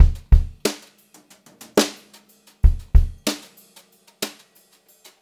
BEAT, Brushed Drum Loops

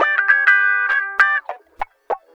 Guitar Loop; Ambient Grooves

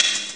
plop; Amiga Soundtracker Sample Packs

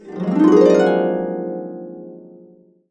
Complete Harps; USB Soundscan vol.62; AKAI

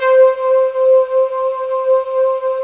HORNS C5

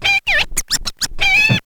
scratch kit, vinyl; scratches; Masterbits; Vinyl Attack

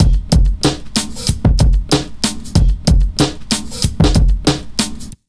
94 Beat, Underground Hip Hop; USB Soundscan vol.02; AKAI

